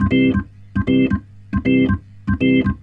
Roots Reggae, B-3; Sounds Good - Roots Reggae

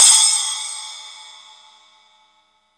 SPLASH; East Collexion; Drum 1 Dry

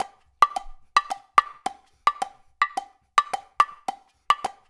LOOP R, Percussion; InVision Interactive; Percussion Slam; PROGFROG

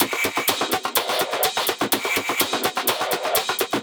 Hats, Drum Loops 125bpm, Nu Dance Electro; Zero-G; Soundclash Nu Dance Electro; Acidized Wavs; Groovin Noise

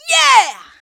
VOX, Singin

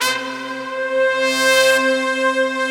big brass, Slow Big Brass

